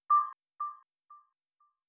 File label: message, rainy soundscape